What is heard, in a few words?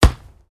Soccer ball kick street soccer